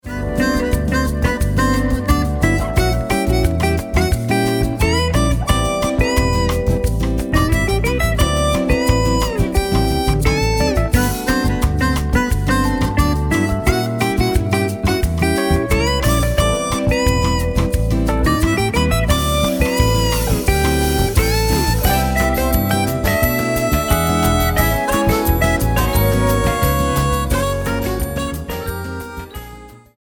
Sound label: samba